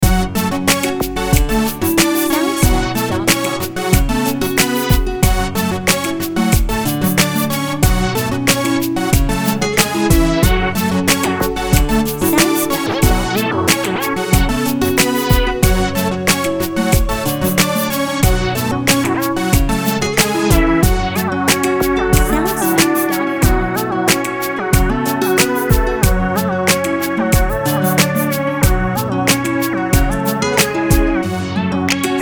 Beat For You

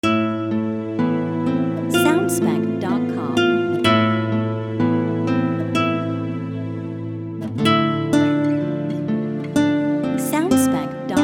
Guitar Of The Past Short Track

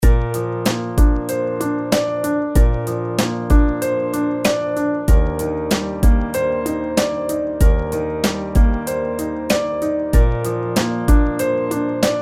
Sweet Piano Loop With Drums

